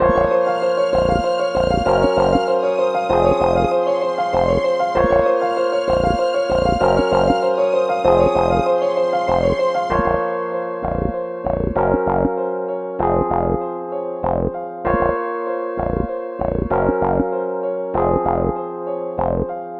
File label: West Coast Melody Loop 97 BPM A# Min; Cymatics; Oracle Sample Pack